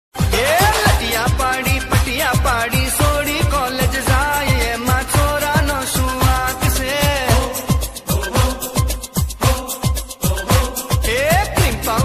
gujarati

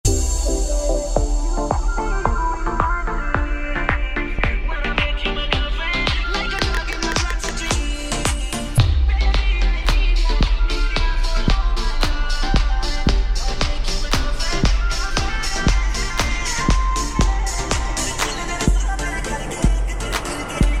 trap

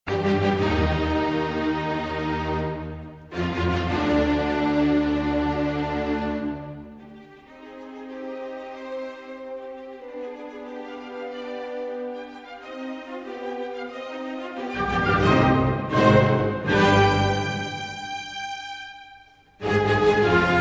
classical sinfonie, ringtones

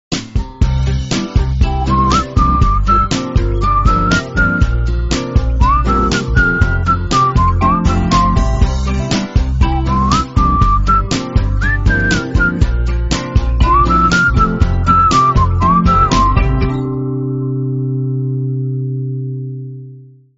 new nokia whistle

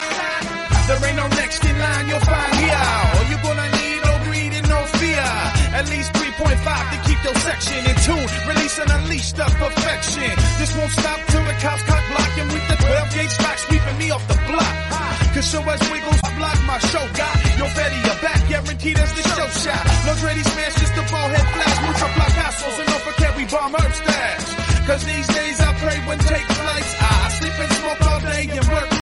mexican rap

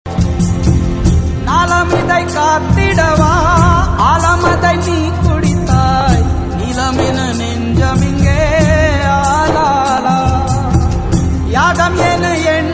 tamil

